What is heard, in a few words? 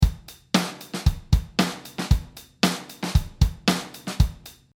Grunge 115